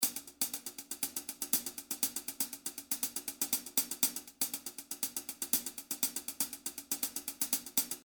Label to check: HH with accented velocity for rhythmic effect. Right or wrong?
Right